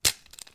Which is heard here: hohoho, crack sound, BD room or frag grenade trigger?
crack sound